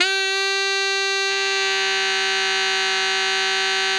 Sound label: TENOR G 4, Saxophones; Greytsounds; Philip Wolfe David Crozier's Saxophones